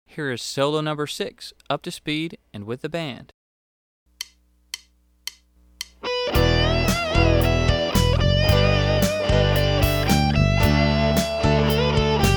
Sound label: Solo Fast